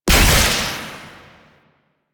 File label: Damage Zone Place; Warframe; Nineteeen Ninety Nine; PvPvE